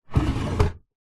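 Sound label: household furniture large quality wooden sideboard drawer open; sidebaord; zapsplat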